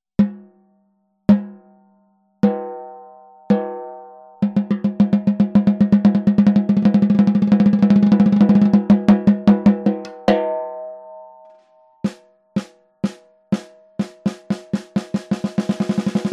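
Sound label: TAMA CAISSES CLAIRES 14X65, snare drum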